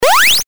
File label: jump